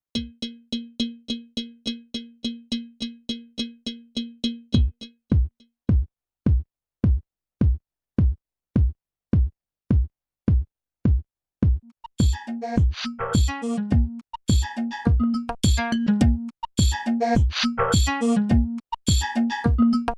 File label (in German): KORG wavestate Rhythm Set mit Joystick Stereo Out